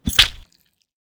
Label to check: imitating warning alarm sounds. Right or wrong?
Wrong